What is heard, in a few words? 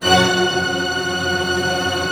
STR TRM C, STRING TREM; Sound On Sound - Peter Siedleczek's Orchestra